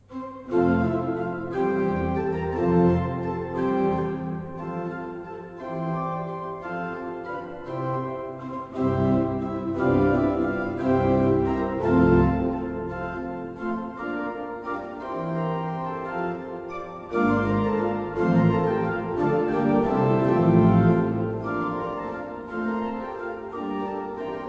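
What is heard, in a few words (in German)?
Orgel, pfeifenorgeln; Seifert Kevelaer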